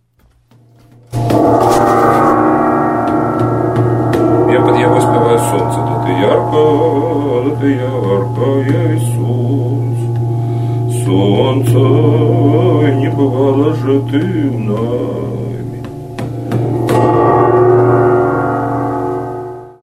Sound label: gong